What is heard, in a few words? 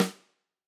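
Tam Snare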